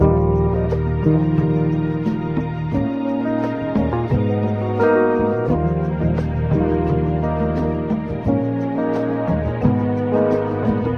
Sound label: keys, phonky loops